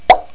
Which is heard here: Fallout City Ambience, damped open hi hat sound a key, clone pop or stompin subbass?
clone pop